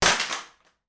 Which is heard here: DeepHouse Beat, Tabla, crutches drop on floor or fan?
crutches drop on floor